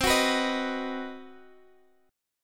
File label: C Minor Major 7th Flat 5th